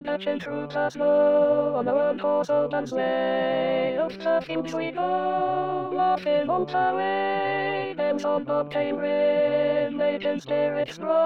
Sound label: Altos; Jingle Bells